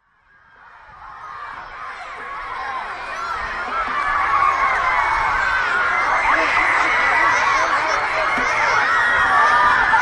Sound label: Screaming